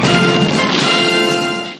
short fanf

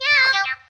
meow

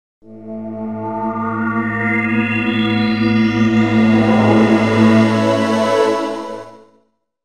startup; hisaturn

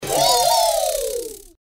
anime girl wee sounds

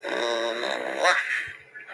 barks like a dog

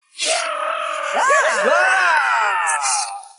apple scream; annoying orange kitchen carnage

